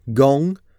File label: gong